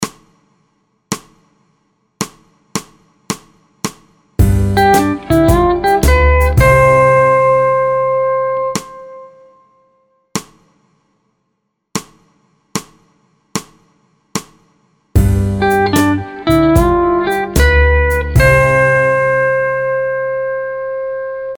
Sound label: Key C Vamp 4 4